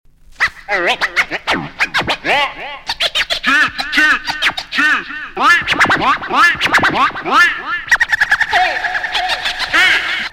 scratch echo

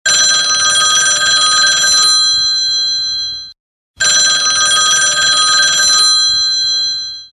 RING RING

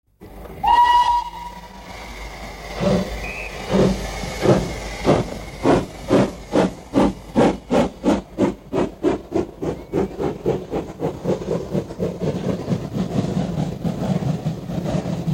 Train, Trains Steam; Bbc

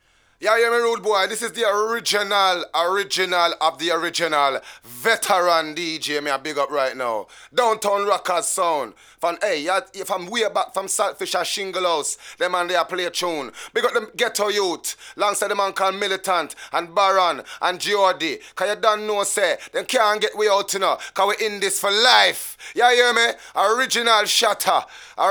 jingle

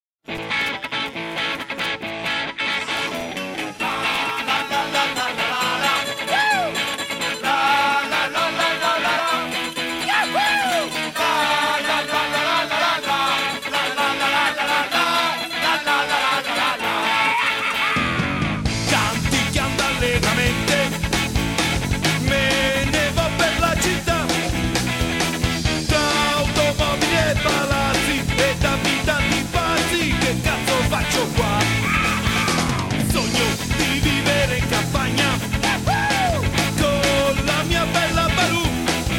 AGRO PUNK

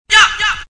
yhooo

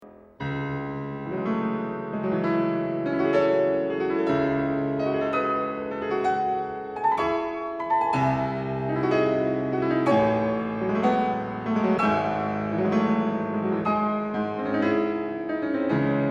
Symphonic Etudes, Piano; Schumann

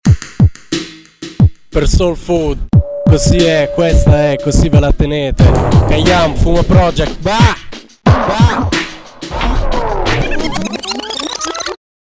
cayam riddim

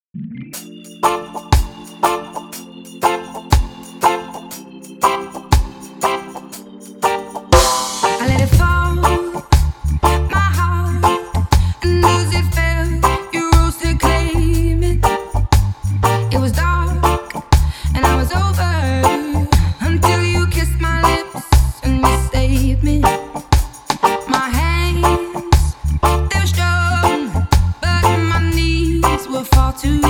(reggae version)

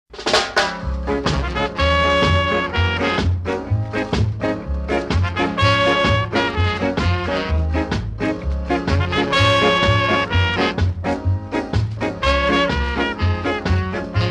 Ska

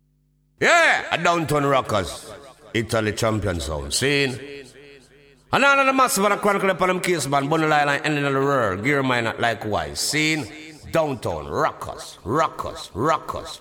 jingle

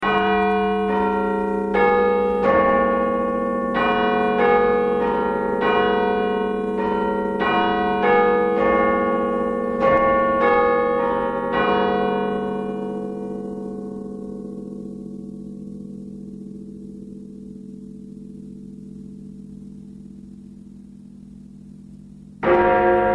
Big ben

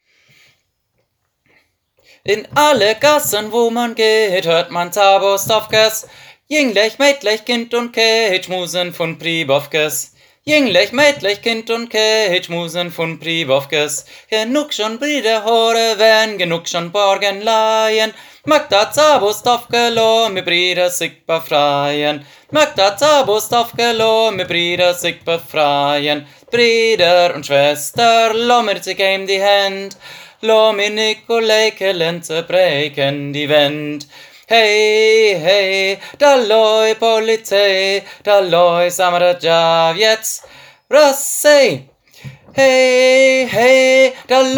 Sopran

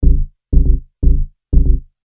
Bassline 120,000 BPM A

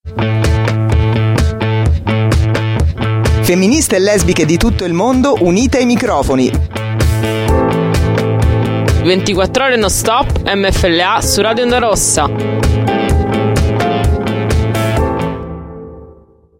jingle; 8 marzo

